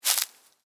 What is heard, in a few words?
Footstep: Individual Footstep On Dry Leaves